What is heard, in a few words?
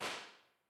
The Beautiful Room, Telefunken ELA M 270, Impulse responses; Henry Olonga